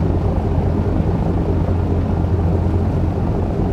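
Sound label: an2 cockpit right, engines; War Thunder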